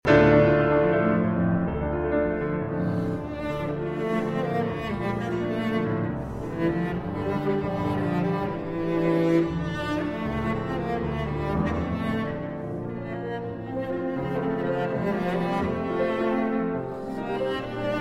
Cello Sonata No.1 in D Major II. Allegro Passionato